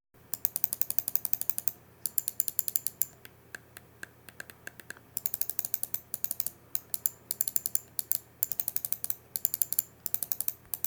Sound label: HyperX PulseFire FPS Pro